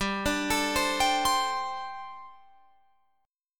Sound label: G Suspended 2nd Suspended 4th G, Guitar, Open F; Gsus2sus4 arpeggiated; 19,21,21,19,22,19